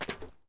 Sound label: jump metal; Escape from Tarkov